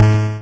fling

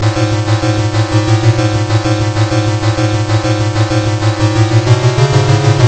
arp

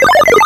trigger

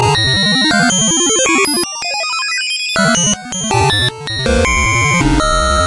think Weird

